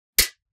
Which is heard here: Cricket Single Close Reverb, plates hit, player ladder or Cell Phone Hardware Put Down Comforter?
plates hit